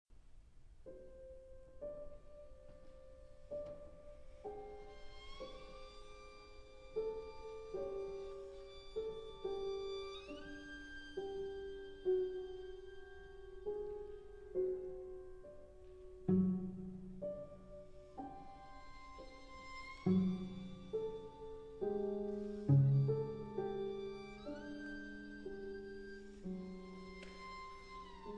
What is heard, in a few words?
Sheng